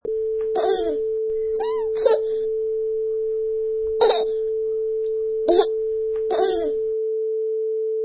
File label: Baby Laugh